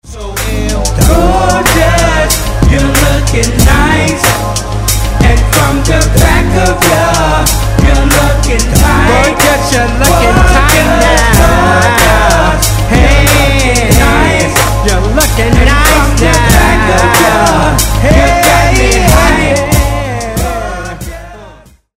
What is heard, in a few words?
ringtone